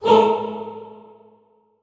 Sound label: HOO A2, Classical Choir SHORT HOO; Best Service; Extended Classical Choir